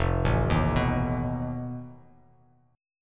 F 7th Flat 5th F Bass Standard; F7b5 arpeggiated

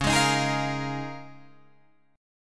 C sharp 6th Add 9th C sharp down Guitar Drop D

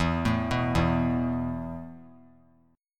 E Suspended 4th E, down, 3C,3F,3A,3D; Esus4 arpeggiated

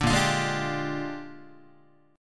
A sharp 13th, Guitar chord; strummed; JGuitar

